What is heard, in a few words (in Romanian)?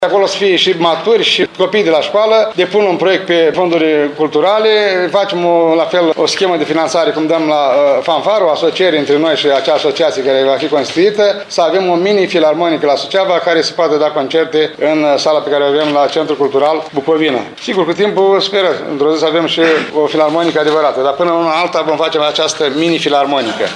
filarmonica